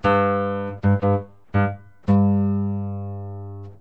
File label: piano